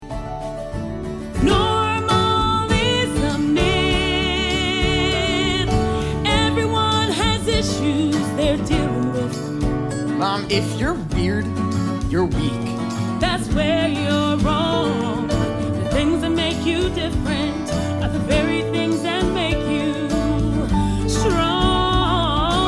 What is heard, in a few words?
with vocals